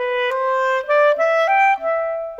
Clarinet; Sonic Foundry (Sony Creative Software); Orchestral VOL-2

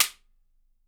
WHIP; Miroslav Vitous - Percussion & Harp

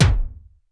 TEK K, TECHNO, Drum Base; 90 sSampleCDs; Crypton - DrumBase5000

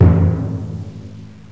timpani; jazz jackrabbit 2

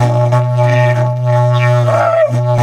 Didgeridoo; DIDGEHORN2

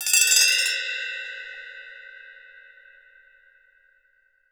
ASCENDI, BELLS CHIMES; Voice Crystal - Steve Reid Definitive Percussion

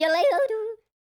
YODEL, World Voices; ILIO; Vocal Planet

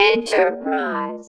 VOX VOX, Electro Vox

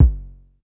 Polite kicks long F#1; LIMAT Div Dept; Drums

